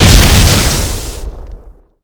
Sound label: hiding explode; merasmus; halloween; Garry's Mod; tf2